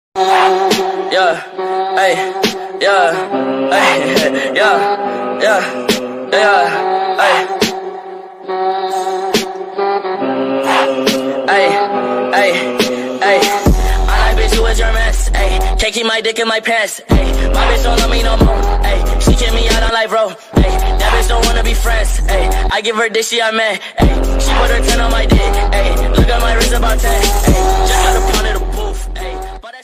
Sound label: attitude ringtone bgm ringtones english ringtone